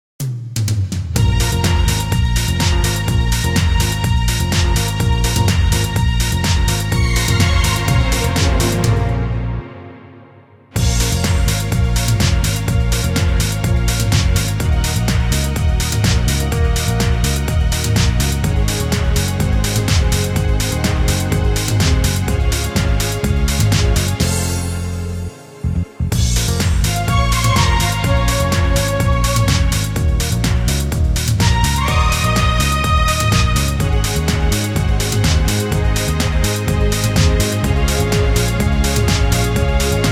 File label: brass minus